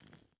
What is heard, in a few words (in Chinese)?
蜡烛环境声; ambient